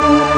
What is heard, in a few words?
ORGAN D; Techno Trance; E-MU Formula 4000 Series Vol. 2